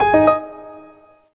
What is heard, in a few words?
Content Alert; msntv2